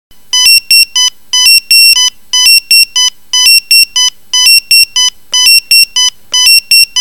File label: Pager